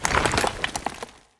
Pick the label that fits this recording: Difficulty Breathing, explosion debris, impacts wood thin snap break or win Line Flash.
explosion debris